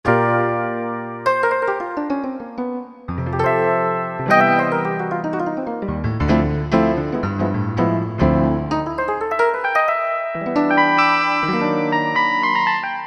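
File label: Mark1 & Piano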